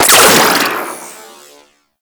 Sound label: Freeze Ray Shot 3D; Warframe; Weapons; Corpus